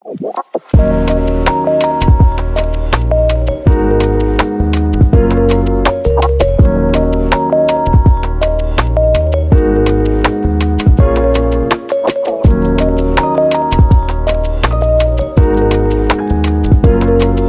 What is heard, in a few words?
background lofi for lifestyle